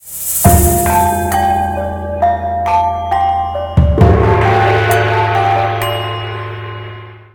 music temple, ringtones; Sony Ericsson; A8i; cmcc

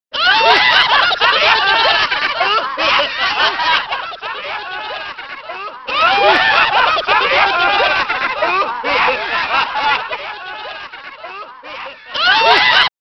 Laughs; Retro Ringtones

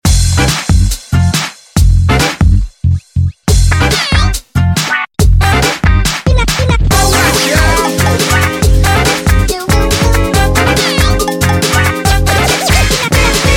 Jaming, phonetones

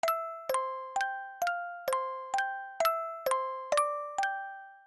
Music Box; OnePlus OxygenOS 13; dynamic notifications

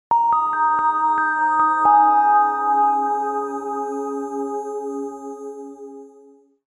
Power On; Samsung Galaxy S SHW-M110S; New SKT

